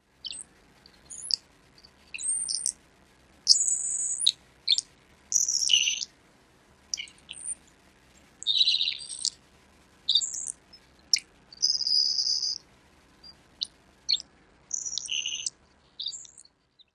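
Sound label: saltmarsh sharp-tailed sparrow whisper song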